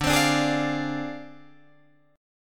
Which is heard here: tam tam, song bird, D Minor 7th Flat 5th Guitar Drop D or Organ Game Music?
D Minor 7th Flat 5th Guitar Drop D